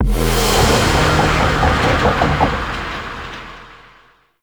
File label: Void Dragon Ring Correct; Enemies; Gameplay; Warframe; Duviri